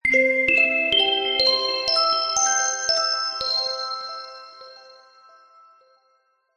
fantasy magical musical accent bright chimes ascending; zapsplat